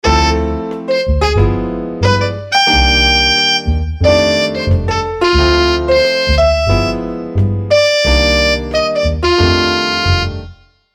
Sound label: Rhythms